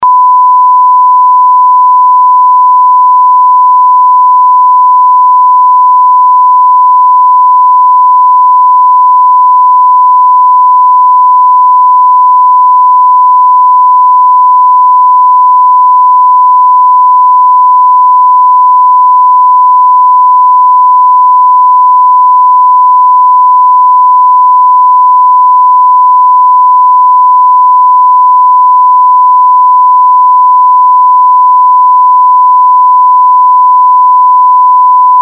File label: 1kHz; Samsung SC-01B